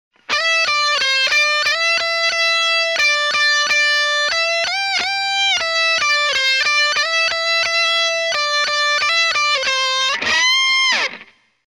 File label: Timbre Rock Melody